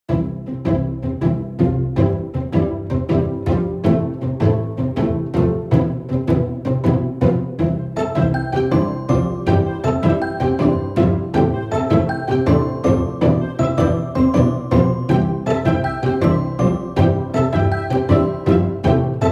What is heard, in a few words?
Playful